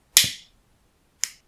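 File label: elektricheskaya zazhigalka vkl vikl, electric lighter on off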